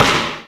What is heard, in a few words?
ball bounce